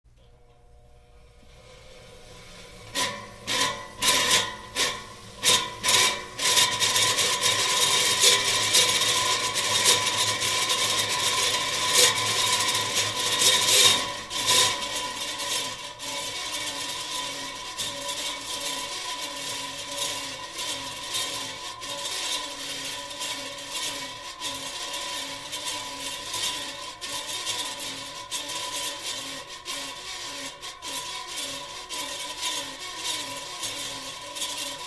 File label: Fan Small Broken; On, Runs With Very Bad Blade Scrapes At Different Speeds, Off, Close Perspective